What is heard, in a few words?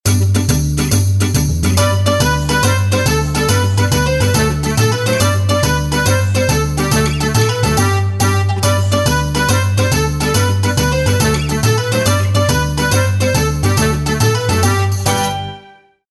Italian Tarantella Dance D Short Version